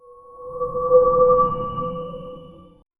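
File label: dark eerie ui notification; ancient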